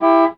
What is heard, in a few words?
honk; BESTSHOT; noisybit